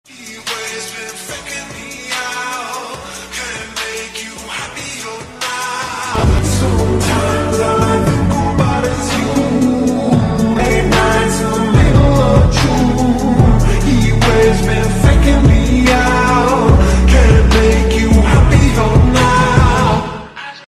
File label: slowed ringtone; android